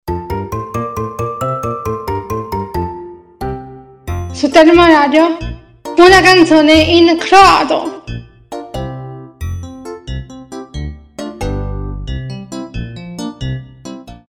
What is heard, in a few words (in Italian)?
Canzone in croato